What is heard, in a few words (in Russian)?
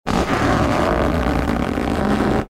strashnyj skrip sharika